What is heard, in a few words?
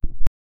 clean heavy clank of iron, armor